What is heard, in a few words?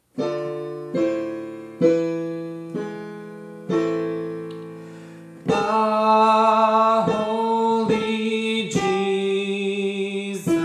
soprano